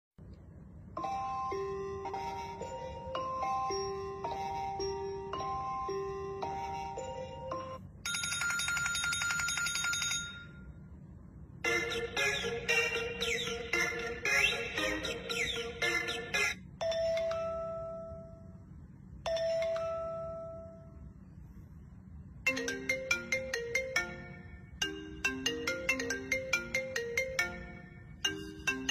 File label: A parrot dance with cellphone ringtones